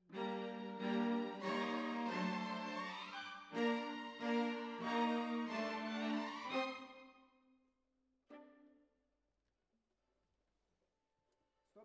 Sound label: Cellos Over